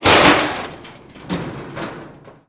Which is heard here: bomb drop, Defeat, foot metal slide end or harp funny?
foot metal slide end